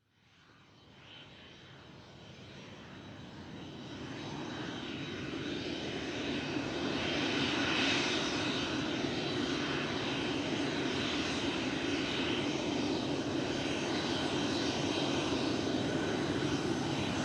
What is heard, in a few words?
Airplane Jet Taxi By, A jet aircraft taxis by on runway.; Aircraft sounds; Airplane Sound Effects; Lynne Music